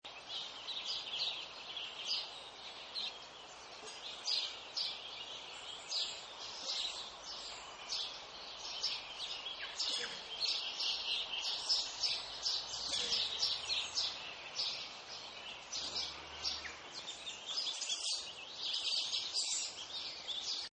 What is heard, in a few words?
Birds Tasman Wind; Nature Scenes; Animals; Birds Tasman Wind 2